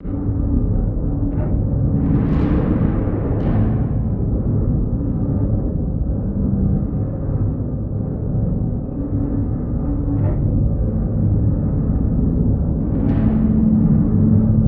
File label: Metal Distort Textured